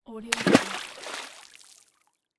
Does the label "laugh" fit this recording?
No